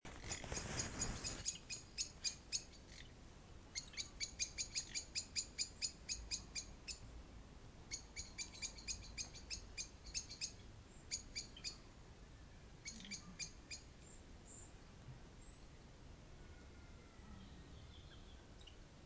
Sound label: birdsong